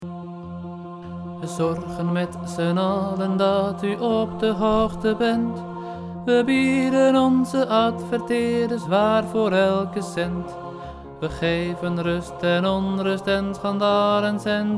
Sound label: tenor